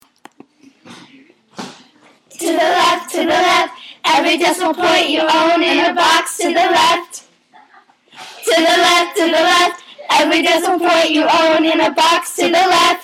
converting decimals song

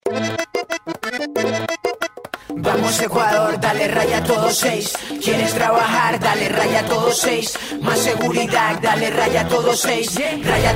jingle; Raya todo 6

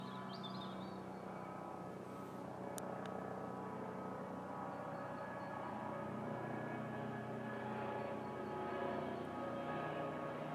helicopter air ambulance coming into land; haukeland university hospital

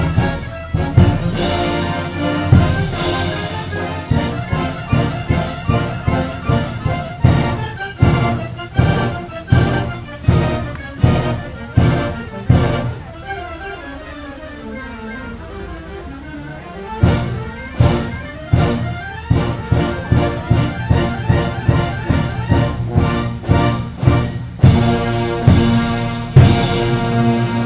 band concert in the park

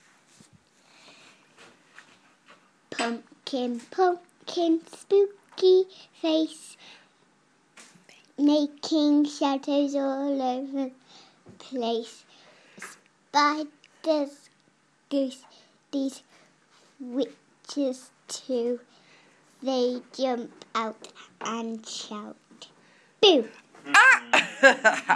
halloween song by small girl

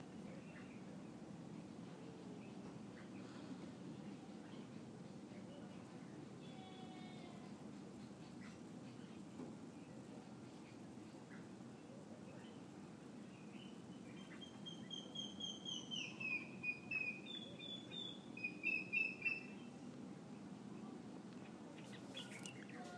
grey hornbill morning

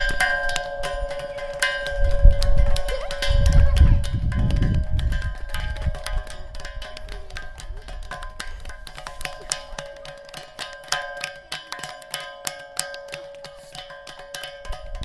banging metal

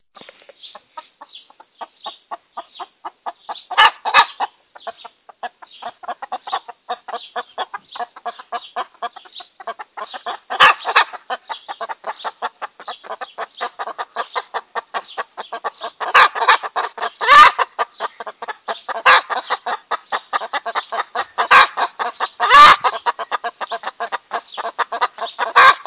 chickens

